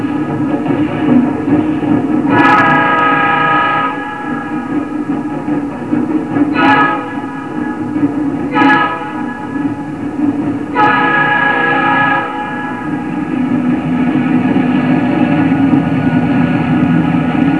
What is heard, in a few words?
train